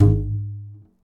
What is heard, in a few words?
Foam Pluck